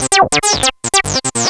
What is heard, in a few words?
ring